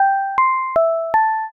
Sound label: chime broadcast